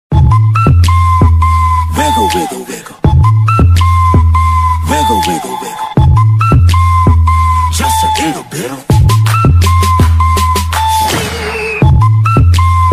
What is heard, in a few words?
ku ku ku kuku ringtone original audio african boy ringtone